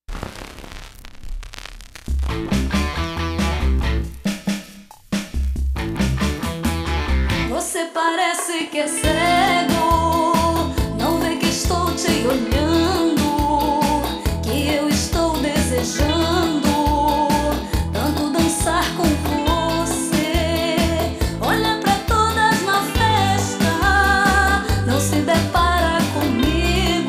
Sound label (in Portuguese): Forro